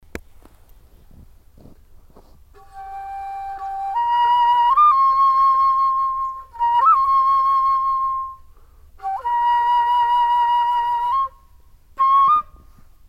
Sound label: improv, flute; tom thomson